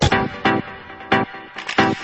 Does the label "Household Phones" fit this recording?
No